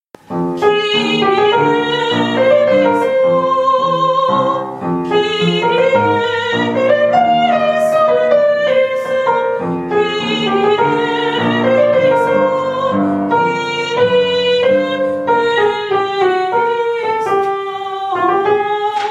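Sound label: soprano; kirie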